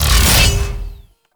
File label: Mech Stab Ability; Nechro Mech; Vehicles